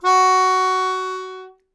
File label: Melodica Long, Long Notes Chromatic